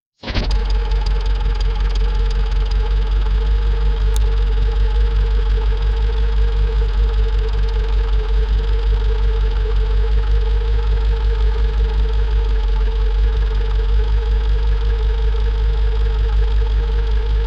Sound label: fictional diesel, cold start